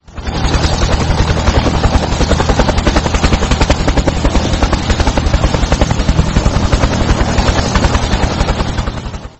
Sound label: Helicopter Startup Ringtone